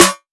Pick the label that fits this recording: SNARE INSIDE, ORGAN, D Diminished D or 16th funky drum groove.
SNARE INSIDE